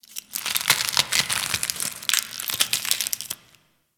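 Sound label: Ice Crackle Random Ambience; Warframe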